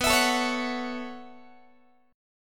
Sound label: B 9th Suspended 4th, down, Guitar; B9sus4 strummed; 4A,4E,5A,5D,5G#,6C#; chord shape; JGuitar